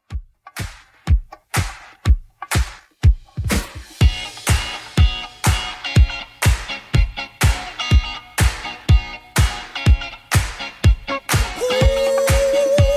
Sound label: 80s Redrum 123bpm CLEAN